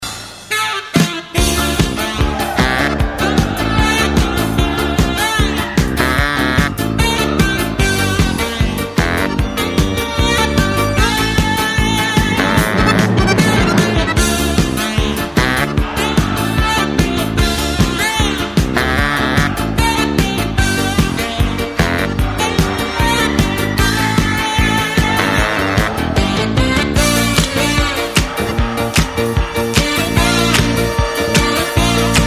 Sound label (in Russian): klassicheskaya muzyka i retro ringtony na telefon